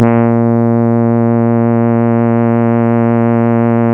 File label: A#, Synclav FM, BRASS; Big Fish Audio